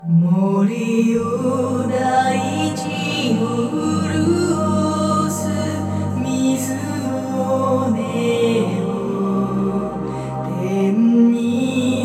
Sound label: xy music